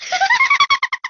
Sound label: giggle; Candy's